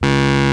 Rhodes Fuzz C#2; Mo' Phatt; Digital Sound Factory Mo' Phatt

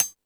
Tool Kit, Drum Machines

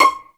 JD PIZ, STRGS

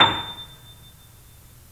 The Piano, A; InVision Interactive - The Piano; BOESINGSOFT

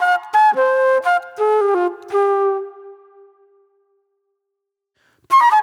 Flute Noir

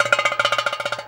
DARBUKKA; Best Service; World Colours; DARBUKKA MLT

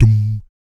VOC PERC, Vocal Planet Jazz FX BASS PERC

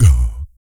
BIG BREATH; ILIO; Vocal Planet VOL-2; Blues & Soul

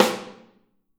Snare OH; Snare OH All; Drums Percussion

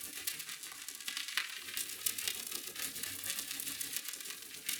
Cracklature, sparse soundscape

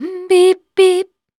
VOC F, Dance Vocals, FEMALE

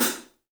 3D Drums, TIGHT ZILDJ; 3D Sound

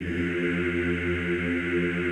EEH F1, Extended Classical Choir; Best Service

